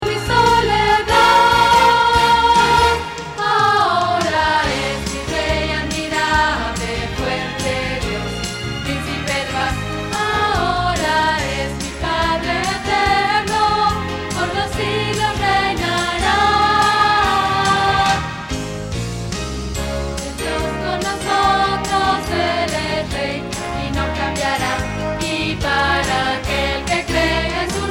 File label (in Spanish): Contralto